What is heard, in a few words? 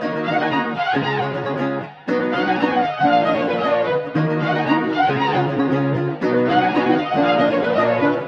strings and guitar, Loops; Phonky